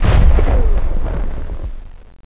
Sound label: frag